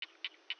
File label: rana nicobarensis, frogs